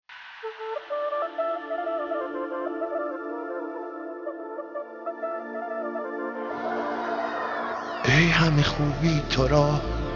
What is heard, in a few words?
Ringtone